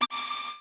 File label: yahoo buzz